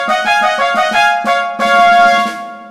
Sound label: Short Piece (Fanfare); Midas Gold Plus